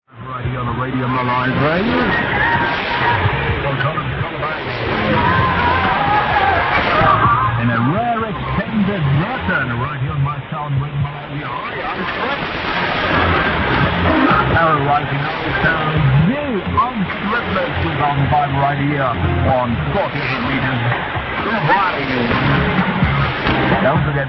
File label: 6310 kHz